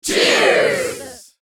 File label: People toasting celebration cheers